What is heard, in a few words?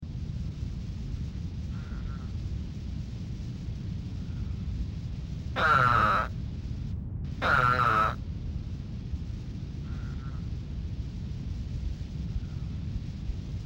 toads Eastern Spadefoot Toad; wildlife